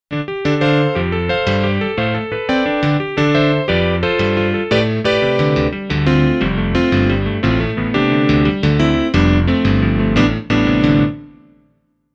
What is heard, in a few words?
Saddest Key